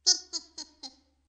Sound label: cute evil laugh